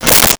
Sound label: Arrow Hit; Weapons & Explosions